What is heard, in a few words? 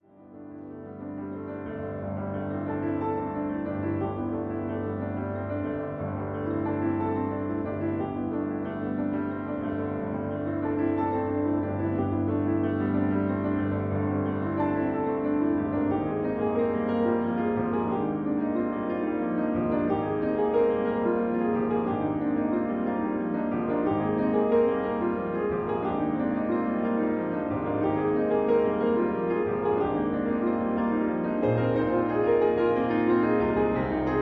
old songs new songs